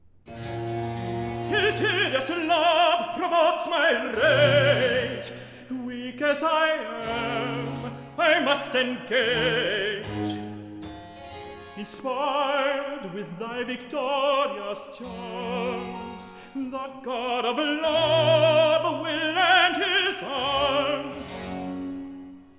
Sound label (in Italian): Recitativo; His hideous love; Handel Masterworks